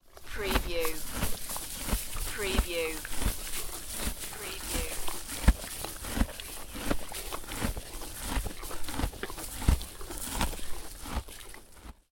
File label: Horse eating munching hay